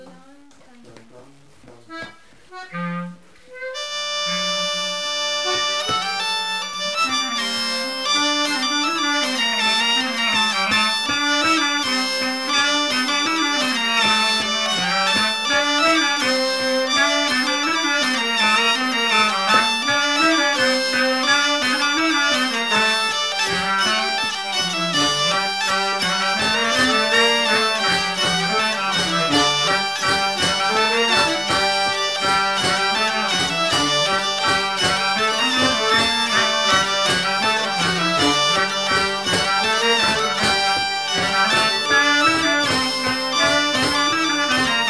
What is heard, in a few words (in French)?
bourree